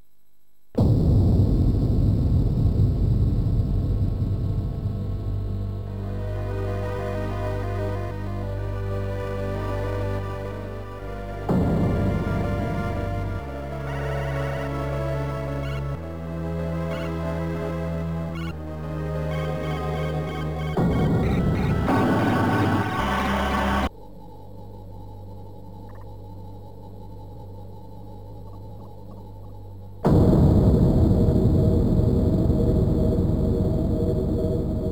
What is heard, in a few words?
intro, a500 filter, amiga; hardwired